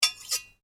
Blade Contact Scrape Fast, Foley sounds for melee weapons; Scrapes (Sound Effects:Objects)